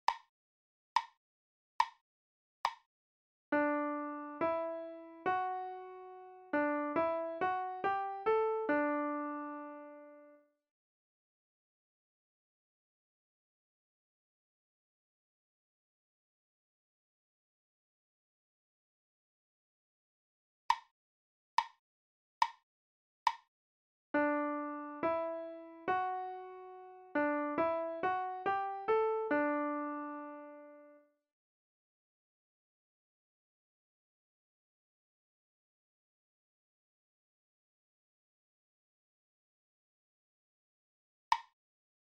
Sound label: Melodic